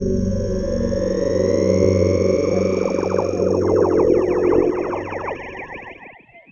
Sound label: Sat Telemetry; Earth and Beyond; EA Games